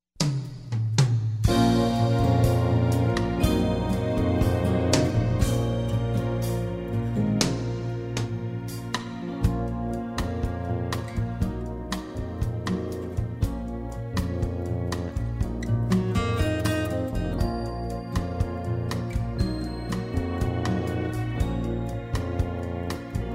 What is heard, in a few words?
Bossa Nova